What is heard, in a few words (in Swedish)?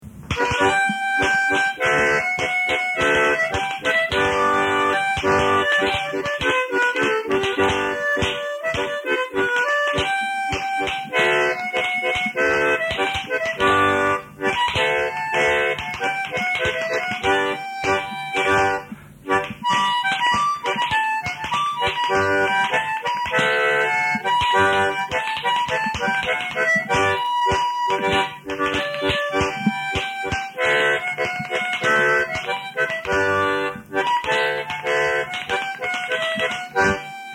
Marsch, ringsignaler; skralbergs